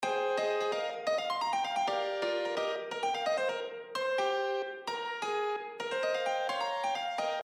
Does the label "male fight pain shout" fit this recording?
No